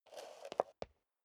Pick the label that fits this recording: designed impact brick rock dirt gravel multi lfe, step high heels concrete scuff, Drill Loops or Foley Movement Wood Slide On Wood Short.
step high heels concrete scuff